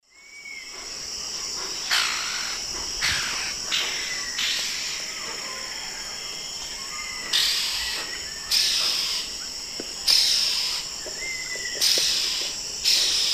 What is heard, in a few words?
Donacobius atricapilla, calls; S. America; Eastern Lowlands